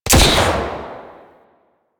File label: Sidearm Shot, Weapons; Warframe; Tenno; Wraith Sidearm